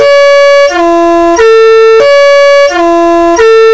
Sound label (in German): posthorn